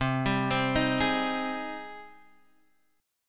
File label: G sharp Major 7th C